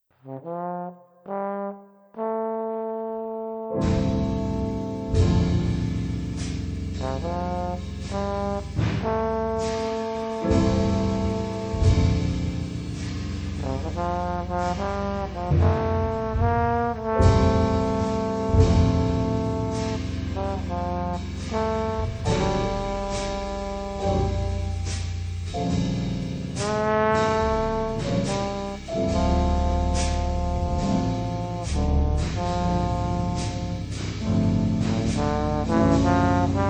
jazz

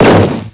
hit

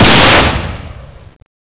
sparkshot fire

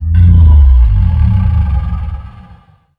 moan; gonarch

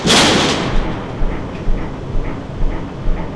door stop; scmod

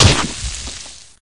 bio impact; scmod; ut99

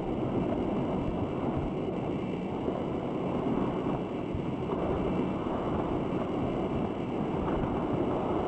creepy loop

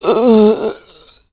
zombie idle, male

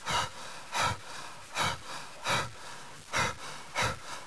breathe

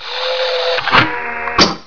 dual reload

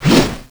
wrench miss

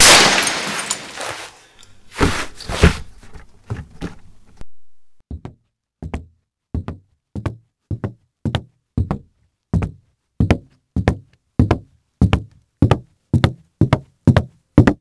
window smash

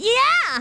pain; goten

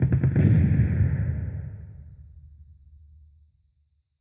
guns distant

